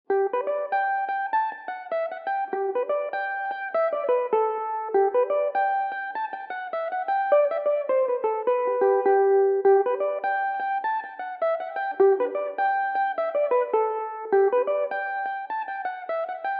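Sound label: G major, mandolin; Belmuse EM-190 electric mandolin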